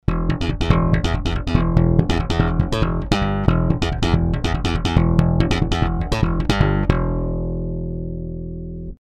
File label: bass; UFX PLUS